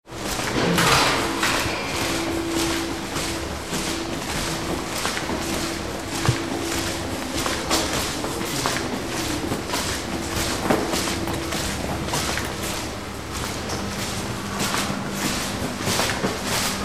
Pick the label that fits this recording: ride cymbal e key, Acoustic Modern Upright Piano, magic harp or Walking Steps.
Walking Steps